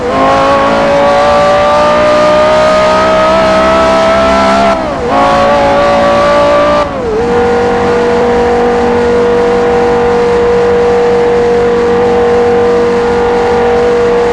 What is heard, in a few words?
fourth cruise, vehicles; carreragt